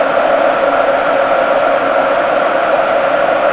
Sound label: Large Crowd Sing Loop; David Beckham Soccer; XBOX